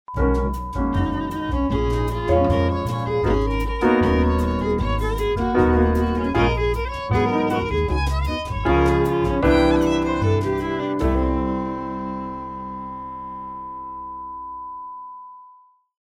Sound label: groovy jingles